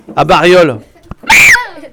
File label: ahahaiiii